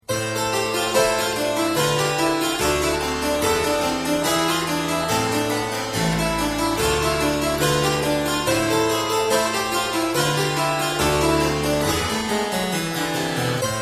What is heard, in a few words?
Gavotte